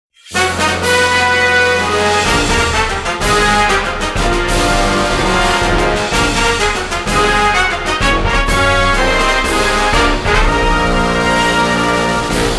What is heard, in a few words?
Fanfare; Pocket Card Jockey Original Soundtrack